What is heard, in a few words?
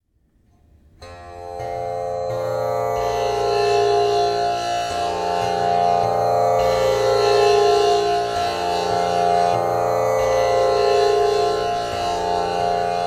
Tamboura Bb